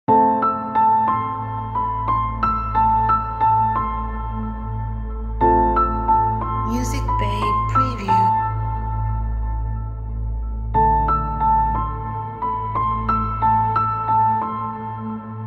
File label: Sad Thoughts